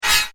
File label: Arcane Magic Stun Spell; fantasy sound effect